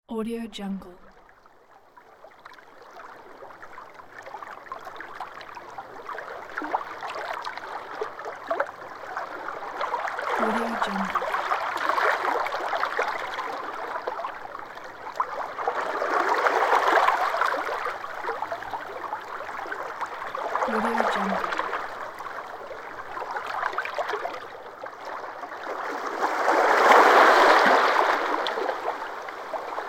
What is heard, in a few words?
ocean waves up close; floraphonic